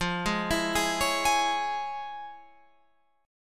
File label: F Minor Major 7th Sharp 5th Guitar Open F; arpeggiated; JGuitar